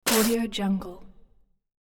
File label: paper puncture sneeze; hollywoodedge